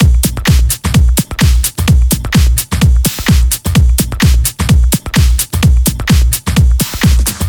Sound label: 128BPM Kit; Vengeance Samples; Pitchford Kit